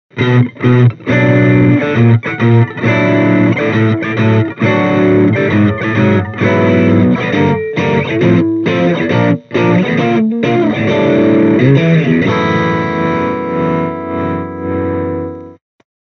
Mini Rox Mic Inside CANNABIS REX Overdrive Stratocaster